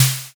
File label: snare